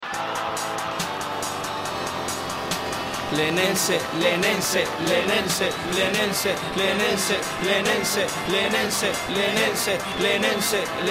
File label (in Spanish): RAP CON TOQUES